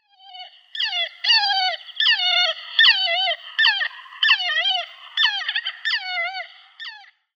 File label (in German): Rosenmoewe Rhodostethia rosea